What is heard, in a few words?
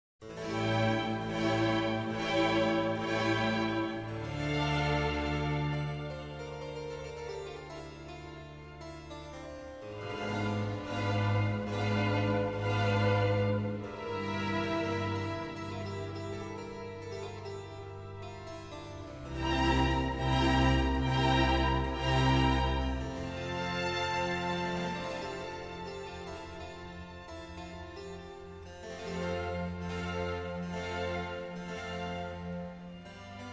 Concerto for two Trumpets, Strings and Continuo in C major; II - Largo; (Vivaldi); Trompetenkonzerte